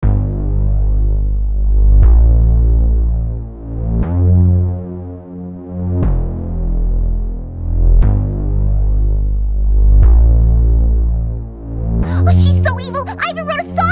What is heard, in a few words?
Supersaw Hoover